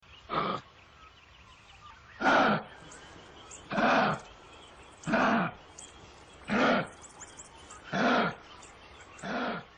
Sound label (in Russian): Kenguru Zvuki